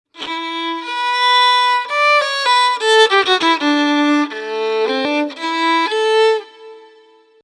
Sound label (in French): impro violon; loto sonore